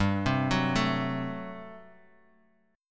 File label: G Major 7th Suspended 2nd Suspended 4th, Guitar, Open F; GM7sus2sus4; arpeggiated; 7,7,6,4,x,x